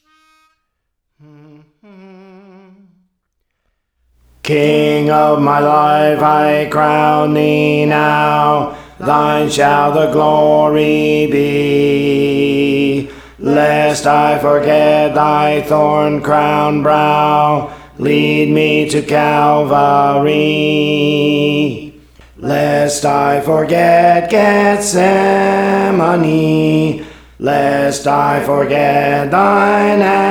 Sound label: Alto; Praise For The Lord Training